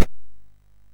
sid MOS6581R3; hvsc

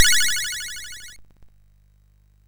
sid MOS6581R2; hvsc

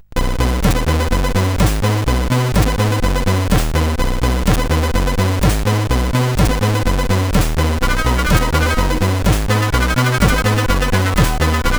Music, CSG8580R5; hvsc; sid

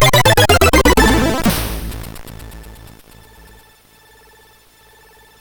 sid MOS6581R2; hvsc

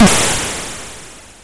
sid MOS6581R4; hvsc

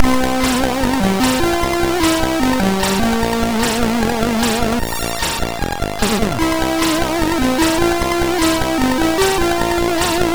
sid MOS6581R3; hvsc

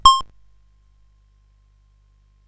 sid CSG8580R5; hvsc

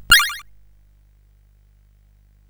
sid CSG8580R5; hvsc

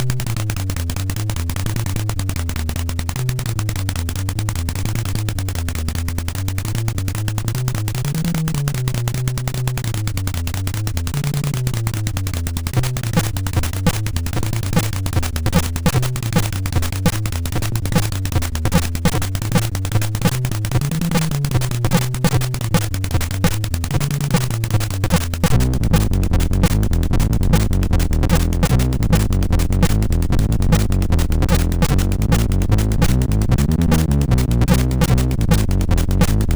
Drum n Bass, sid MOS6581R2; hvsc

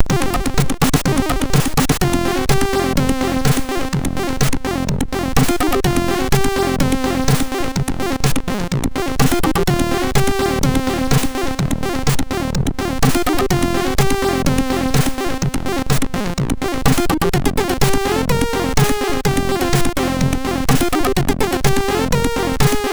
tune, MOS6581R2; Magia 2; hvsc

